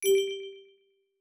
Glass

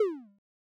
delete, soft

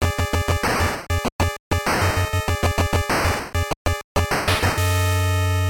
level intro; robocop

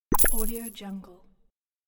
Upgrade Weapon

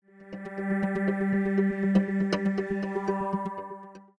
sys 4 Sec Alarm

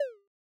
undo, soft

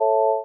resolve, retro synth; agentmag

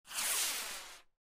rocket launcher; quake

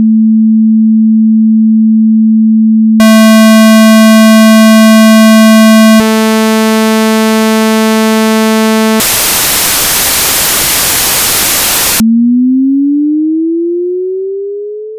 tone test

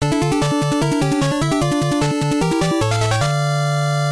all clear; castlevania

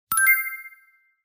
coin

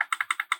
triple click

